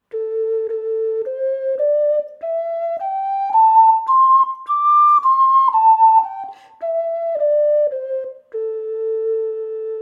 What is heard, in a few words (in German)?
Indianisch A, Indianische Ocarinas